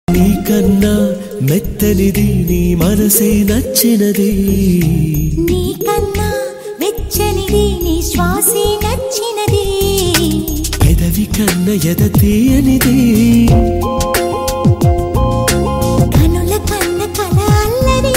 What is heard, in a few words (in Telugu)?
devadasu love songs, telugu love songs